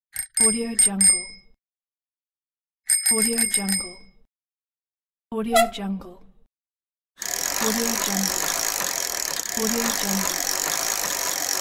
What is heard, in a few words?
bicycle sound pack